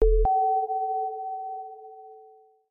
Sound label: notification; atlantis